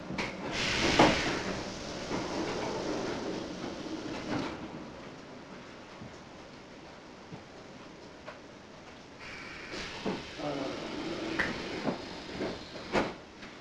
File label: Train